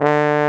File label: BARITON; Best Service; Alpine Volksmusik